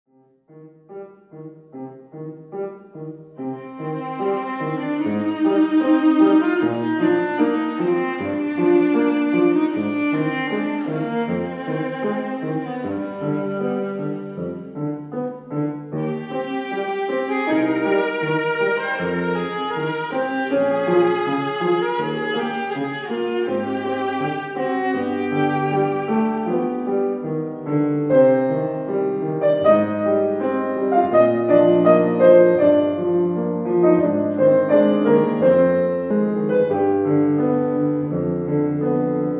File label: Pavane, viola mit klavier; trauermusik leipzig